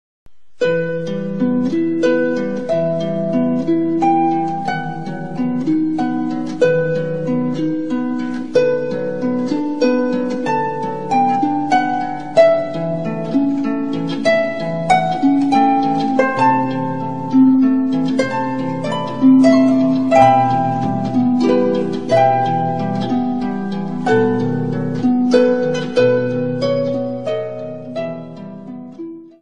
lira; instrumentos

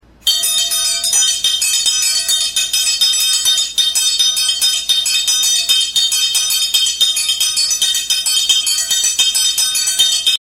triângulo; instrumentos

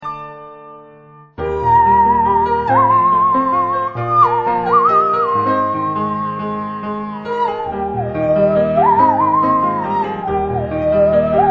serrote

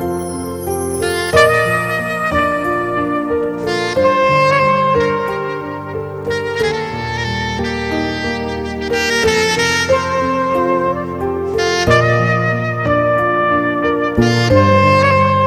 saxofone; Instrumentos

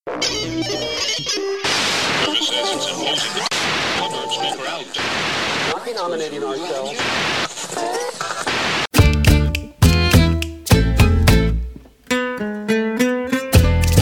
trecho bolero; PGTIAE 2011 - Ritmos Musicais

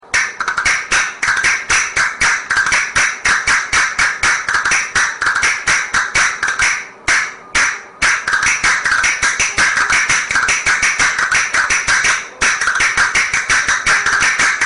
castanhola; instrumentos

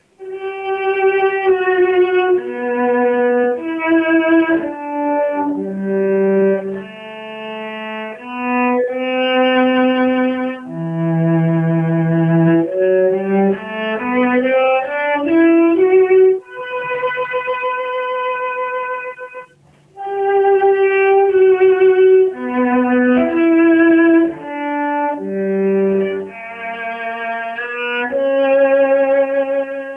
erro violoncelo; Instrumentos

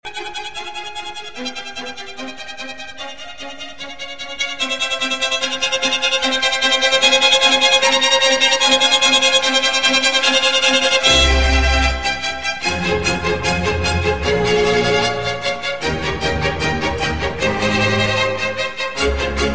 violino; instrumentos

